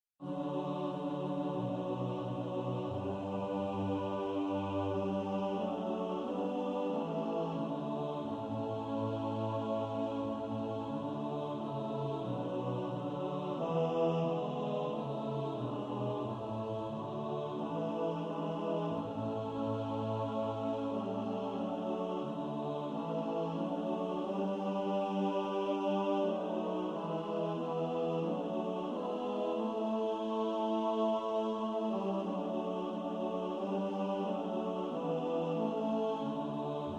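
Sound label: tenor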